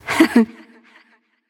heh heh; bellona

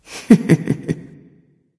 heh heh; smite; voicepack; nuwa

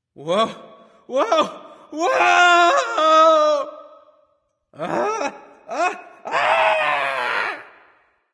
fear; erlangshen

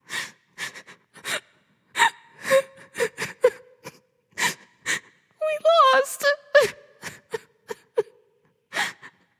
Boohoo; smite; pele

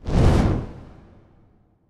fire; smite; hades

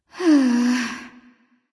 nv sigh; smite; awilix

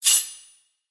idle claw open; smite; god daji skin15

